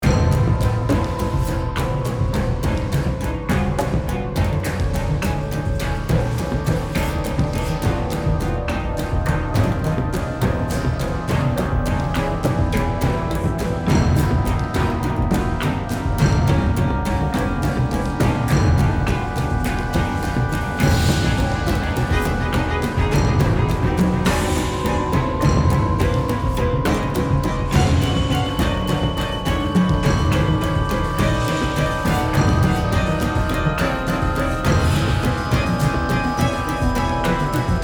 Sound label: Tense (Loop); The Walking Dead: The Telltale Series